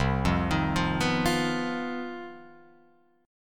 C Minor Major 7th Double Flat 5th C down Guitar Open F; arpeggiated; JGuitar